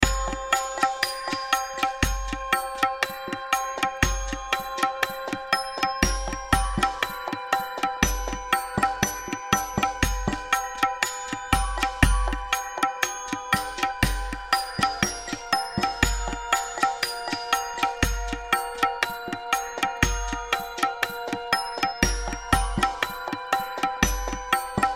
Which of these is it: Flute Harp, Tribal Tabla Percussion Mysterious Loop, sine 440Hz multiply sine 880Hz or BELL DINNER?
Tribal Tabla Percussion Mysterious Loop